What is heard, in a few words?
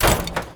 Metal Ladder Hit; Warframe; Ambience; Nineteen Ninety Nine